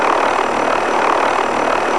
JET HEL

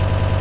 button up

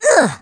GRUNTS; Big Science Comics; Theatrix

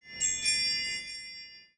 chime; The Lord of the Rings The Fellowship of the Ring; Gandalf

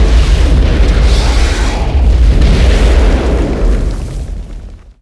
plasmanuke; Starship Troopers

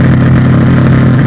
in car; Driver; CD Expert 39

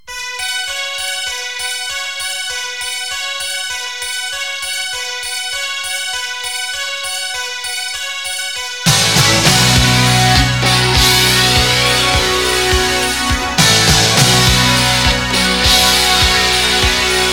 ROCK; L'Effet Pommier - Volume 04; MUSIC

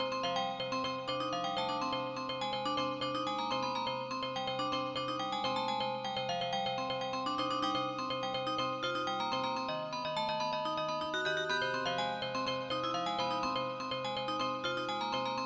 tune; blix

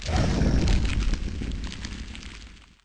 crash

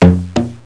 balls

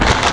Paper Crunch; Glider PRO

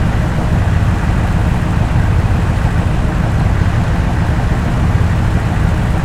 Truck-Idle; 1944 Battle Of The Bulge

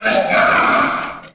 enemy kill; wob2 demo

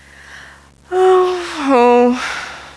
SIGH; WAV Sound Effects; people; illness

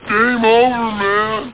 GAME OVER; Amiga Games 1996 #7; AlienSpace

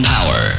hone

